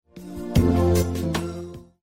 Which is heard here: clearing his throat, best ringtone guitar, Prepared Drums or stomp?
best ringtone guitar